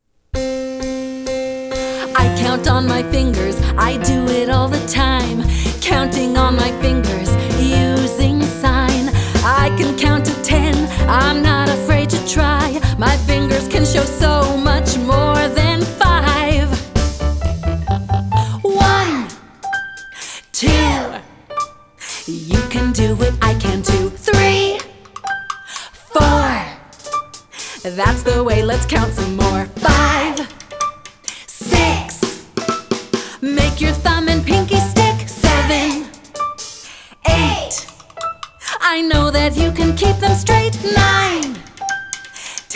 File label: Counting Song